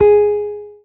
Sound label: marimba guitar; ゆめ2っき